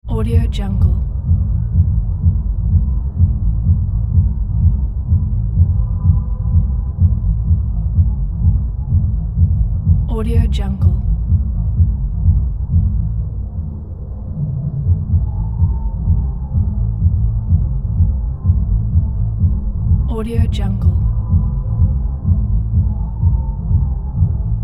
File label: loud neighbors